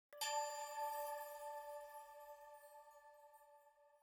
item Jingle Chime Only D

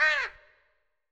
Caw

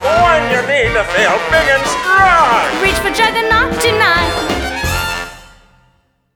Zombie Zod - Perksacola - Juggar Nog Sting

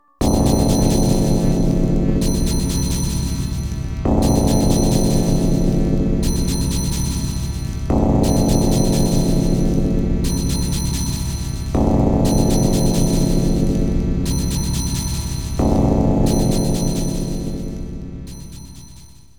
Continue BGM; KANE

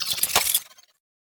Key Unlock Sci Fi; Unending Dusk; 2019 gamerip pc

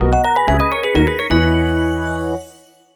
Home Menu Banner

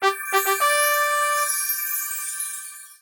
Result Victory Jingle; rakoo friends wii u 2015

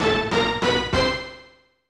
Attacked!; Shonen Ninja Sasuke

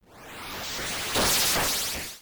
extinguisher

